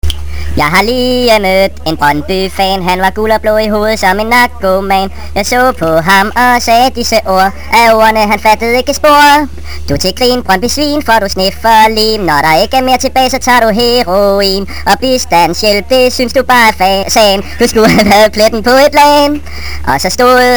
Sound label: cute song